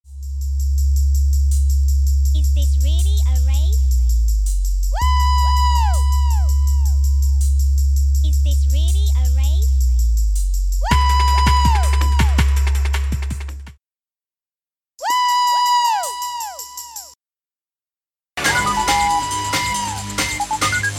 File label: Scream